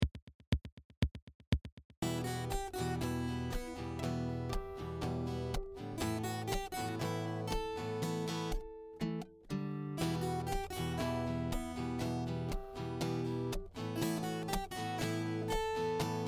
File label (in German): Gitarre und Querflöte, em